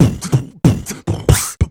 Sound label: Beatbox Loops, Human Beatbox